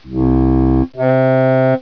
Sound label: interval